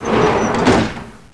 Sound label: door; arcticescape2